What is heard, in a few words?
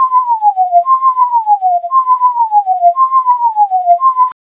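whistle fast loop movement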